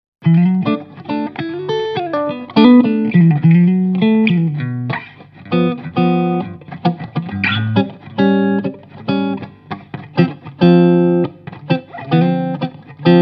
MESA Boogie Mark I clean

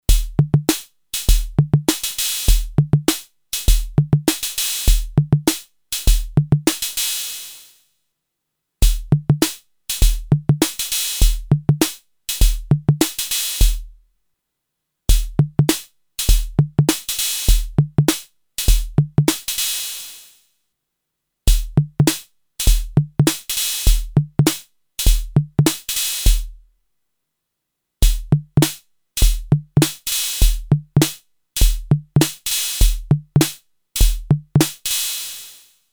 MFB 501 Pro Shuffle

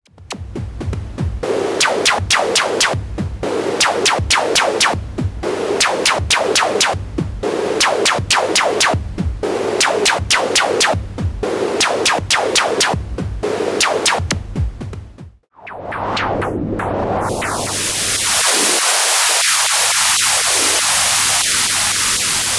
Filter SEM; Arturia

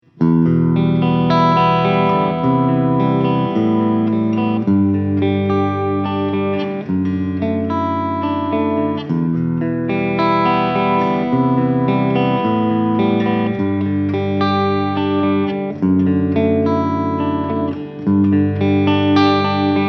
Engl Savage 120 MkII Clean

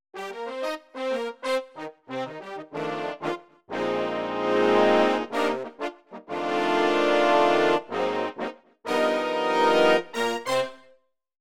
workstation brass; kurzweil k2700; lucid

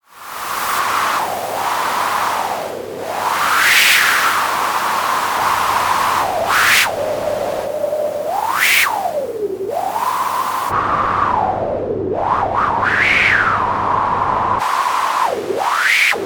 Efektor WF3607

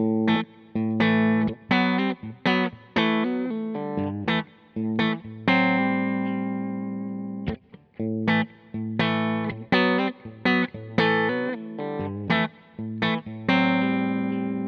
Roswell Bridge Humbucker Clean; Tesla Pickups vs. Roswell

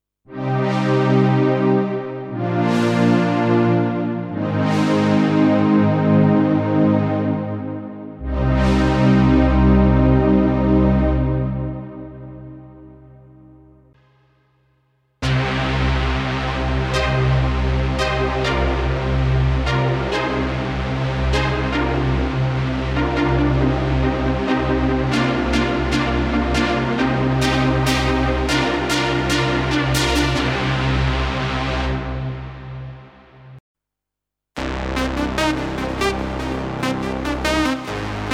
Korg Pa5X Synth Pads

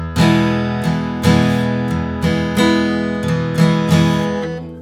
Guitar U87

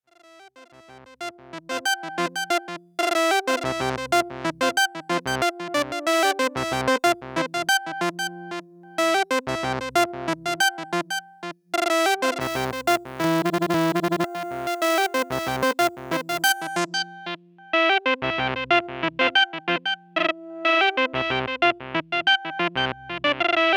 Synthesizer; Ottobit

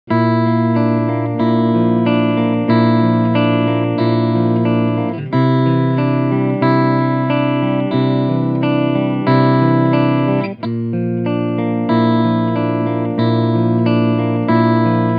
Clean Picking

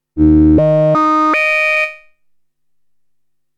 VCF Keytracking Mid; Behringer Neutron

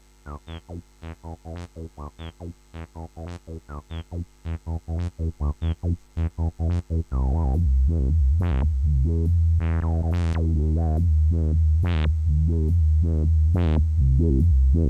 Tascam 688 MIDI Studio

